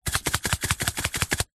industrial chemical powder in small plastic tub shake